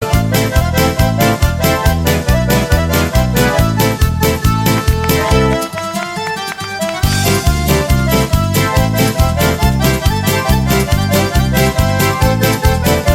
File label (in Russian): cyganskaya polka akkordeon